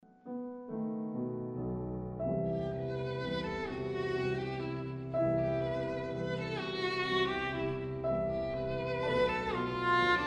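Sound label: violin sonata; akimenko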